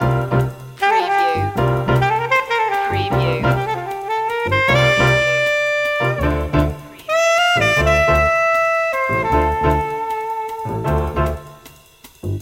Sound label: crazy sax loop